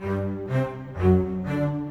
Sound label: Bass Cello, Orchestral; Sonic Foundry (Sony Creative Software) - Orchestral VOL-2